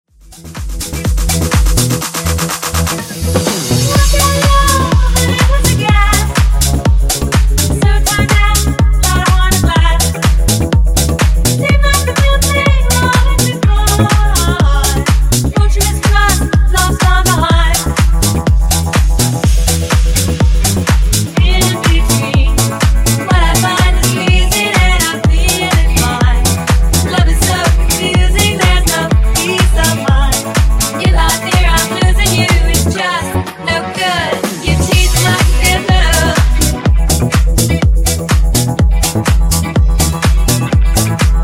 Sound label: Disko Funk 124Bpm